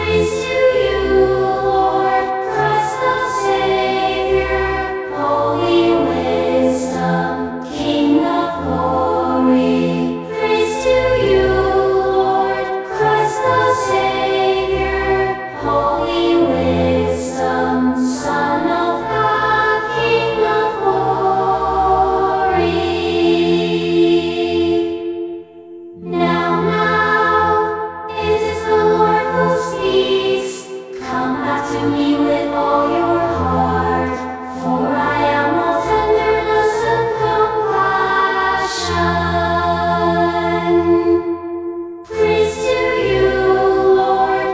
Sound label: choir